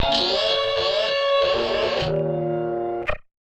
Casio, Glitched, malfunctioning synth

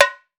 TAMBORIM, Latin Drums; Latin Drums & Rain

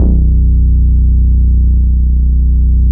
BASS SYNT; Big Beat Samples & More

